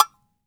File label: AGO SQUEEZE, Brazil; AKAI S6000 CD-ROM - Volume 5